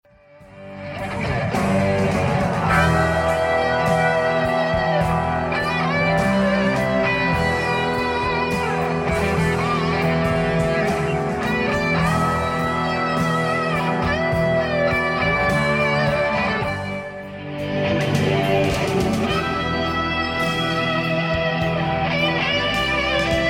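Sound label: Solo Comparison soundboard, guitar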